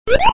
Stomp; Super Hermanos Mauricio

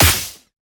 Ice Tile

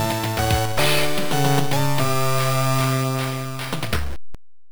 2nd, 3rd Period Start; Hit the Ice; TurboGrafx-16

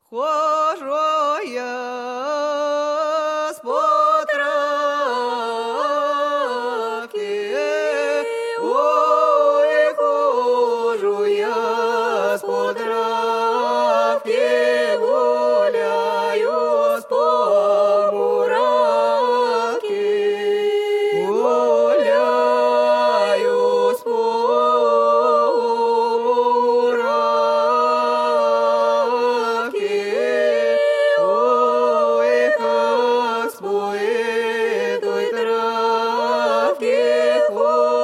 Village Chant; Mount & Blade With Fire & Sword

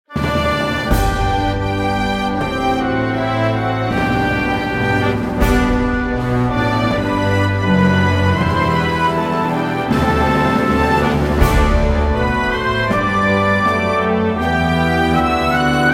Combat Anthem

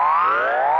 Sparkle Rise Loop; knights try

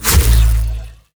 Attack; Shielder

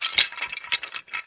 Skeleton; CastleMiner Z; Xbox 360

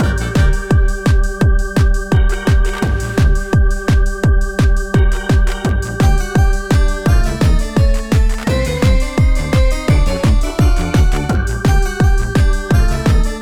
Music Concert Loop; BatBaron

